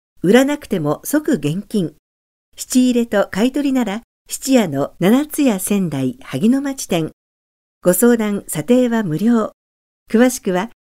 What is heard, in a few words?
Music; Ant Bully